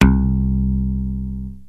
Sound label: bass slap; instruments; lmms